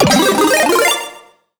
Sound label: Power Up Hammer; SMM2; SM3DW